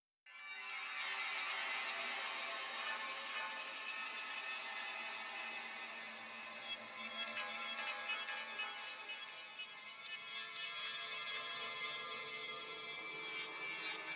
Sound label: Music Essence Room Ambiance; RORA Music EssenceRoom Ambiance CastleboardVersion